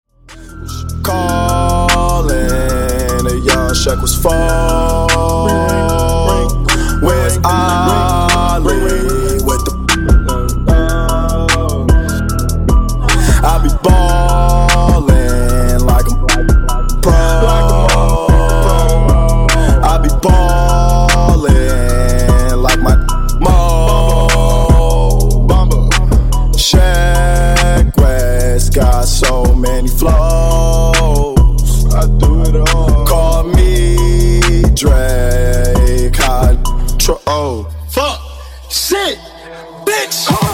Funk Transition 128Bpm

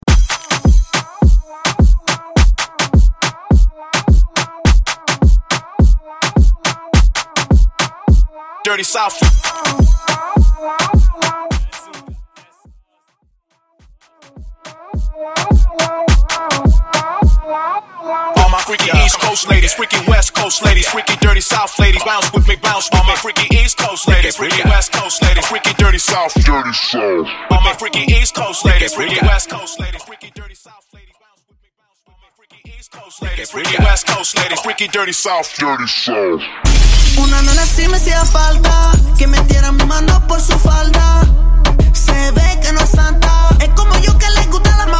Mainstream Extended Mix Hype 105 Bpm

